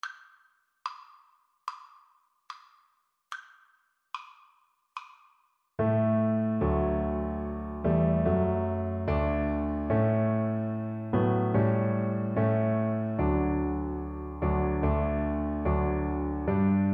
auld lang syne, Double Bass; Slow Playalong

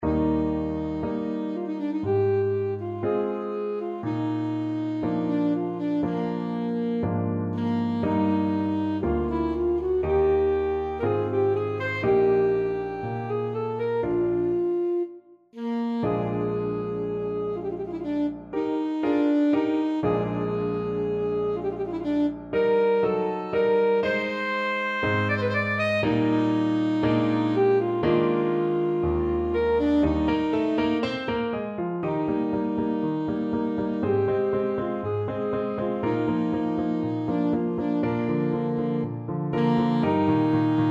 beethoven romance, saxophone; op50

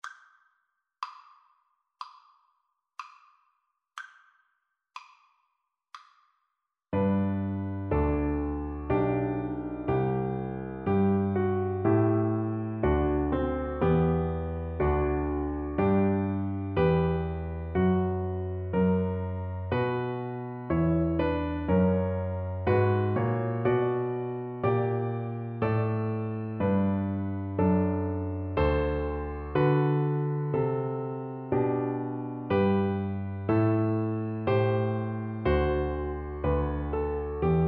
heard the bells viola

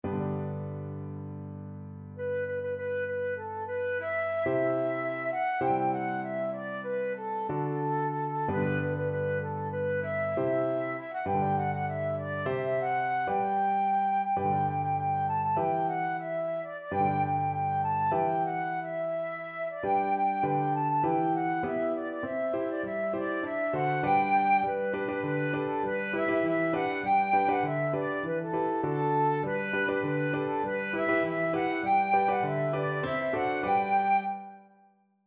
flute

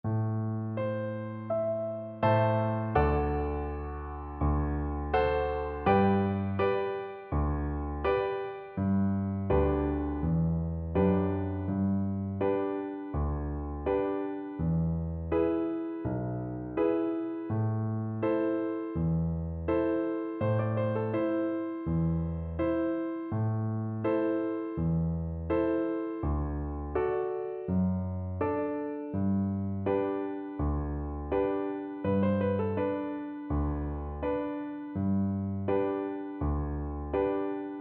fado, cello

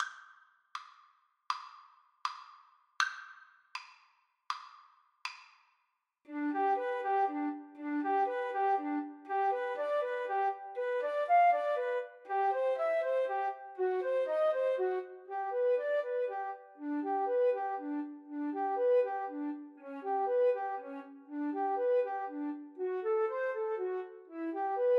Flute duet

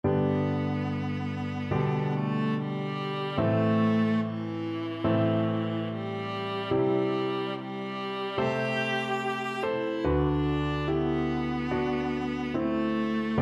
viola

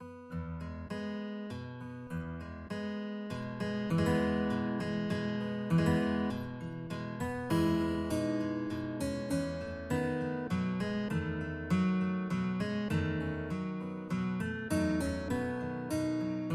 brahms lullaby 2 guitar

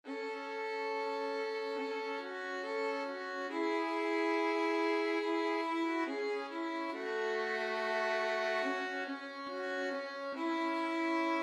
puccini butterfly, violin duet

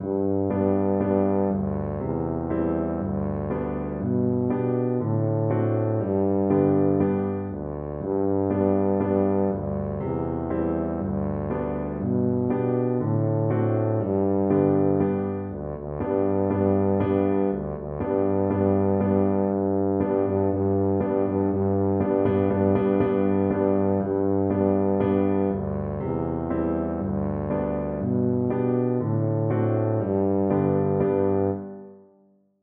old macdonald, tuba

